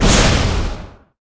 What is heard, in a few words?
skill explo